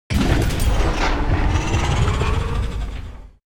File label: terran variation; starcraft2